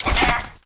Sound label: coin